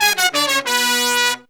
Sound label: 96BPM, Horns